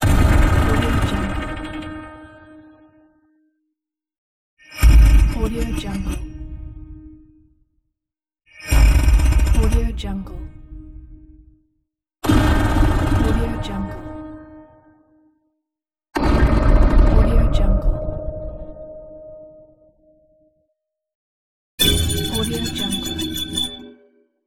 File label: futuristic rattle hits; آهنگ فضایی